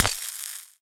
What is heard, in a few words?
atk elec wand funnel